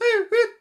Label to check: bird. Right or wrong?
Right